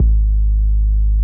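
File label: Bass Drum Loop; T8 Cont; Yamaha DJX-2B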